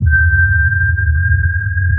sonar Ping Suit Very Close; Barotrauma; Real Sonar

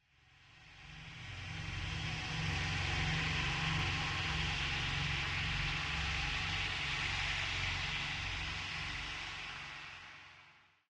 hiss Void; Barotraumatic; Voidstalker

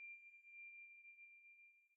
sonar Tail Water Far Beacon Directional; Barotrauma; Real Sonar

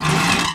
metal run, Footsteps; Thalassophobia Restored

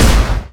small fire; Weapons; EK modern; Barotrauma

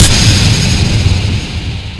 sonar Tail Water Very Close; Barotrauma; Real Sonar

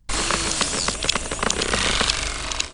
guardian; Barotrauma; Fractalguardian

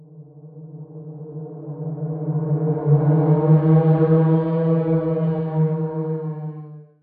Ice Whale attack; Barotraumatic

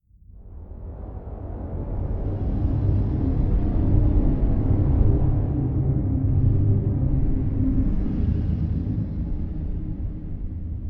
start Drone; Barotrauma; Subsurface

